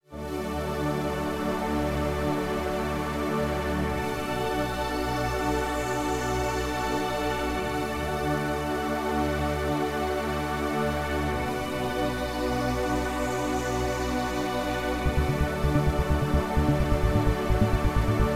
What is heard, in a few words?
vocal trance